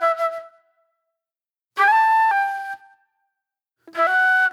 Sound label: Sparse Flute